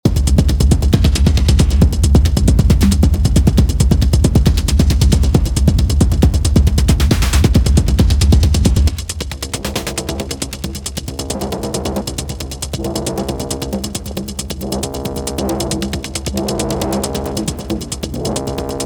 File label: sawtooth